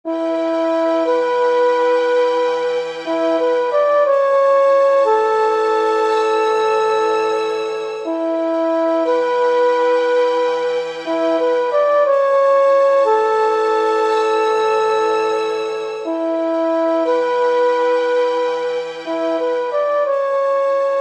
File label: BGM Victory; crayon shin chan arashi o yobu kasukabe eiga stars; nintendo 3ds